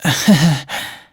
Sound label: cute laugh; adachi